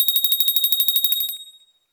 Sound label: alarm, alarms; freetel